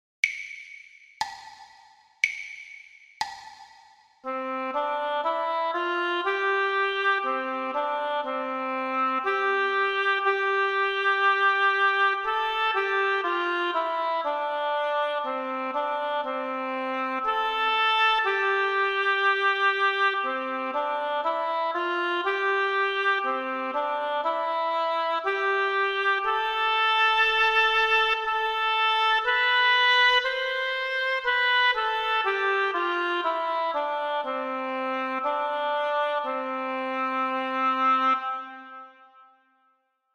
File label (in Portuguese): percepcao ditado